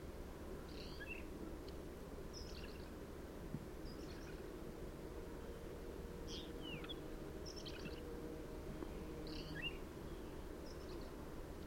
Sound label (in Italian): Culbianco